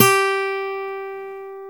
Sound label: OVATION A GT G3; AKAI S-Series CD-ROM Sound Library VOL-7